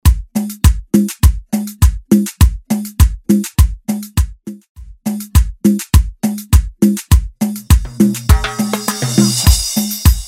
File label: intro outro party 102 bpm; dj f one